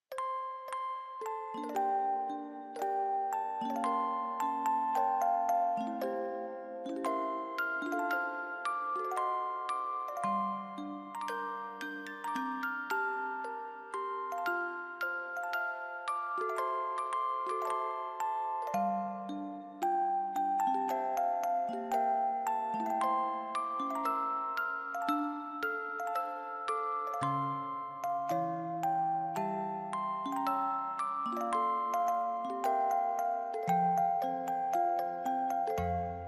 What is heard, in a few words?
music box ver.